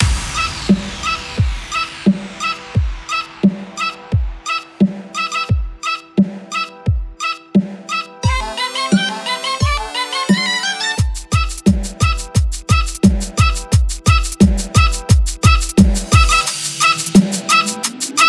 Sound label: Bird singing dubstep